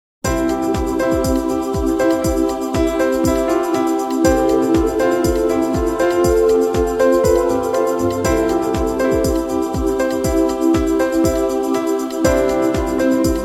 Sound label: piano